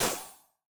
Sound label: ball hit cold light; CrossCode; battle